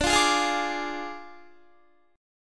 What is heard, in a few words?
D Diminished D, Guitar; Ddim strummed; 21,20,20,22,20,22